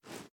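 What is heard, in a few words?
pistol reload fast arm; gmod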